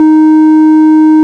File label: ORG Farfisa